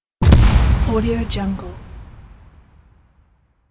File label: hit fx; 3dhome